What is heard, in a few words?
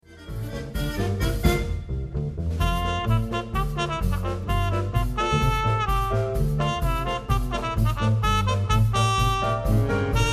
cup mute